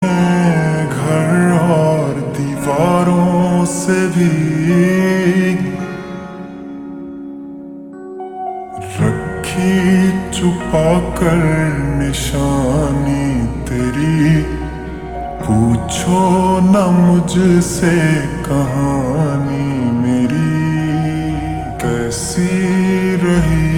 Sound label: Ringtone Slowed Reverb